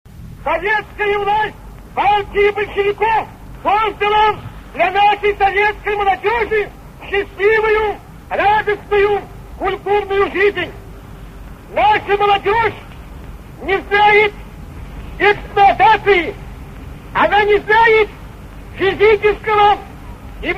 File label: megafon music